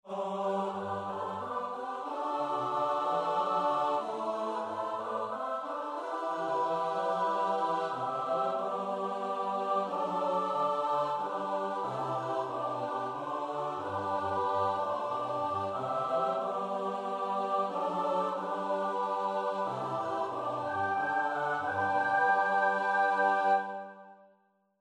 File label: midi; dabrowski; vivat